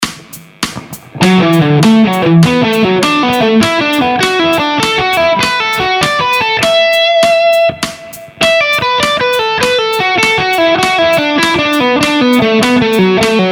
Permutazione 321 Pentatonica Maggiore

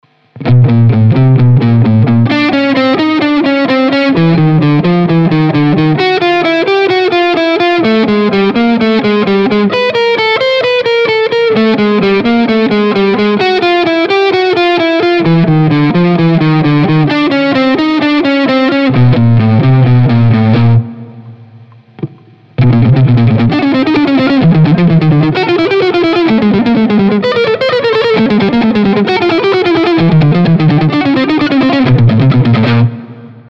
Salto di due corde

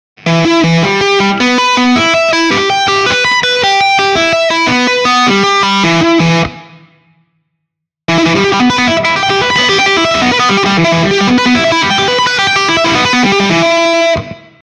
Esercizio 2 Arpeggio minore, guitar

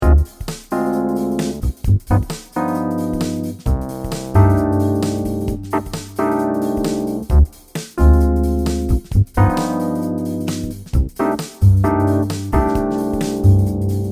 solo base

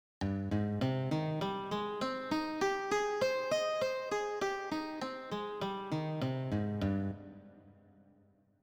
arpeggi

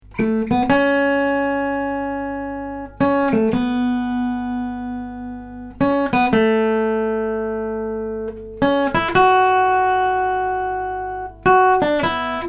Melodia

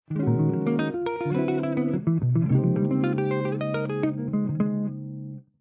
Arpeggi Irregolari

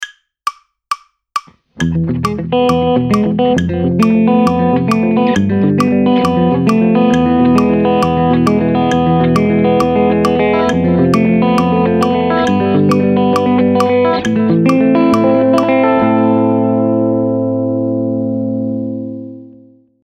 Esercizio Hybrid picking accordi, guitar

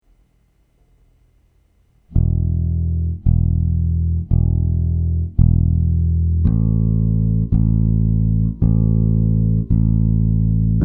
Pizzicato a 4 dita

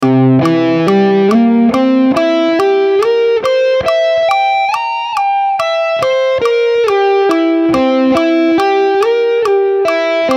Triade M + Slide 7+

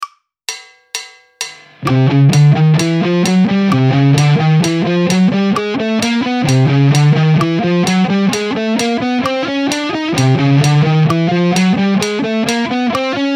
Scala cromatica posizioni non consecutive

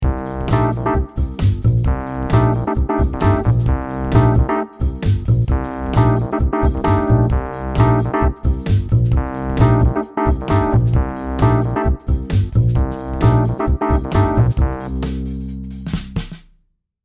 solo base

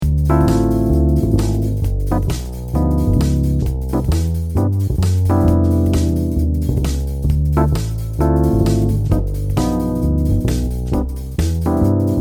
solo base accordi 8 bar

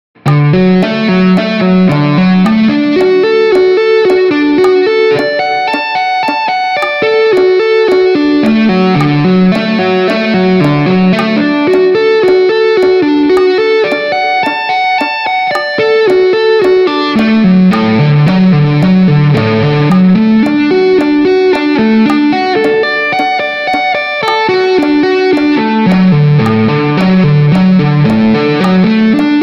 Concatenazione Rivolti rallentata 50

